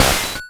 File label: noise; foxdot default